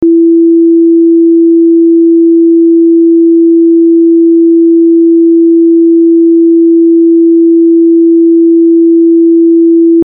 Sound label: i Bump 333Hz